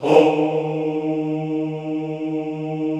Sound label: HOUUU, Classical Choir